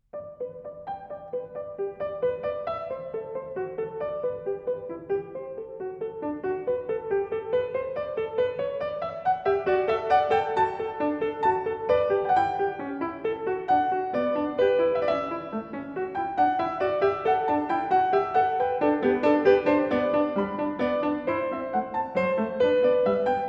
Fugue No. 15 in G Major, BWV 884; Bach; The Well-Tempered Clavier

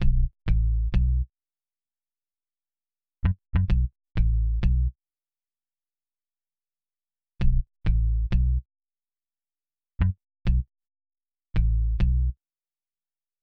Hip-Hop; Strung Along 65

